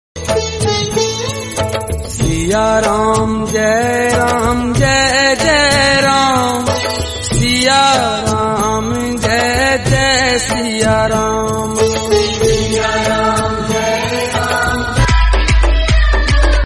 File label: Hindi Devotional Callertune; Jay Siyaram